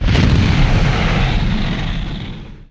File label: Incinerate; war3source